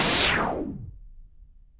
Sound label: NOISE SHOT; Best Service Alpha Dance vol.2